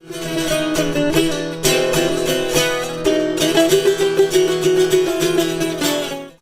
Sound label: nervous riff; pees